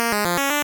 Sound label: 8bit se